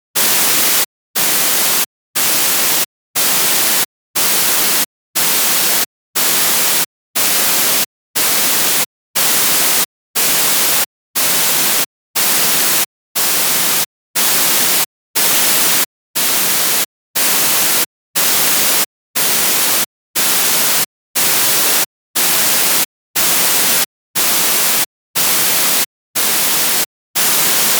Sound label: white noise pulse; sfs localisation